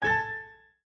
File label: Festival Ding, windows 7